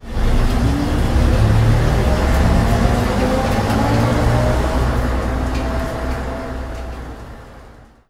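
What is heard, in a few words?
leyland titan pd2, bus; Simutrans; Pak128.Britain-Ex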